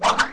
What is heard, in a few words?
joker attack